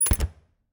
Refridgerator, old fashioned, wooden, steel, brass clasps, hinges, close, squeek, piercing, wide; Eiravaein Works; Vaeyan IV